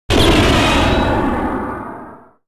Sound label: gun